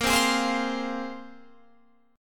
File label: Bb 7th Suspended 2nd Bb down Guitar Open F; strummed; Bb7sus2